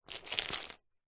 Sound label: industrial chain med sized set down on soft surface; zapsplat